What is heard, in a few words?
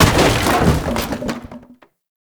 Wood Fence Impact; Warframe; Ambience; Nineteen Ninety Nine